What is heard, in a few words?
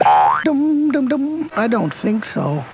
boing